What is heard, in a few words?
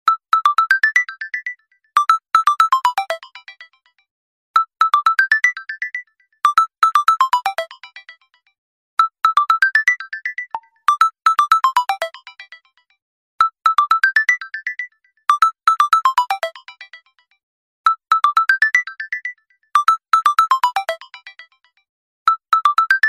tapping ball incoming call tone